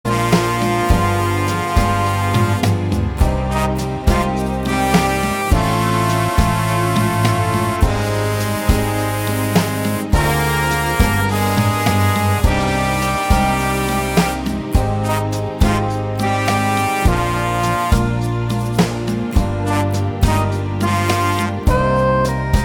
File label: rumba